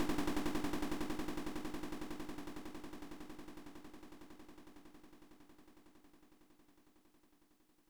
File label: Rock Fall Danger; Pooyan; Arcade